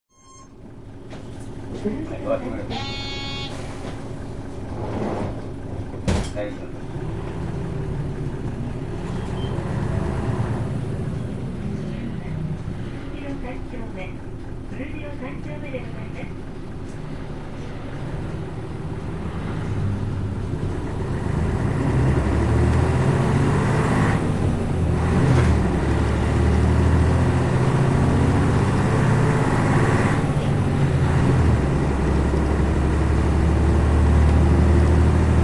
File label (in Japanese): 走行音, いすゞ P-LV214M; 仙台市交通局; 霞の目; 遠見塚小学校前→古城三丁目